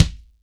KICK, MG KICK

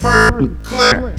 ROBOT VOX L; Big Beat Samples & More; AKAI; VOX FX1 KIT

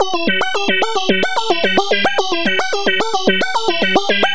synth arp; Electrocution

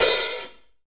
Room HH Long, Room Drums; Akai MPC500

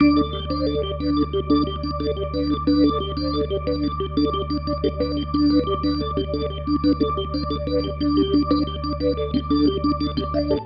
Pattern, Organ; Sonic Foundry (Sony Creative Software); Joe Vitale Organ Donor

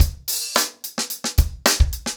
110BPM, acoustic drums; The Roots Drumloops

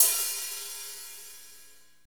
HAT, Drumscapes Roland; Northstar; Fast Shuff

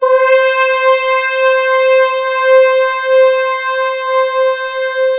brass lead (C); Sonic Foundry (Sony Creative Software); Ugly Remnants VOL-2

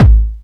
BD, 2 Step; USB Soundscan vol.51; House Side Of 2 Step; AKAI

